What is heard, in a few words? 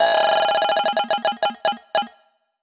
coinroll; Rizline